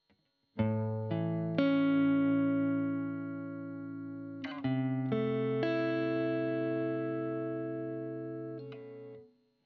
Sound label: GTR Royer